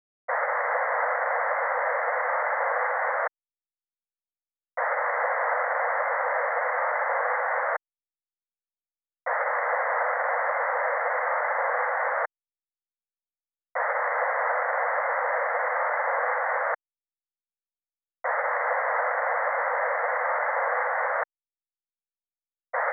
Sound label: Stereo phase; Stereo Setup and Imaging; Surround Spectacular